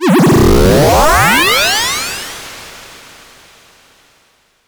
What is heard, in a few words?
Misc Short FX